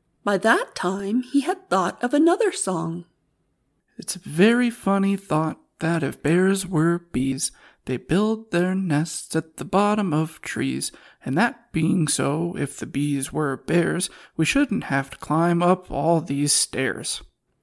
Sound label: song